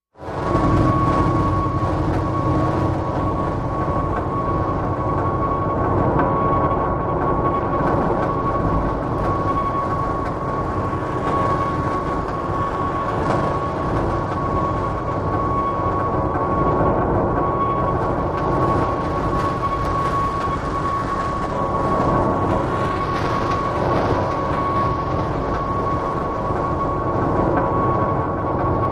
Foundry Floor, Machine, Factory Ambient, Metallic, Distant Atmosphere